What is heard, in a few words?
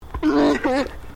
ANIMAL ALPACA MALE GRUMBLE; Alpacas on a farm in Gippsland Victoria; Miscellaneous Animal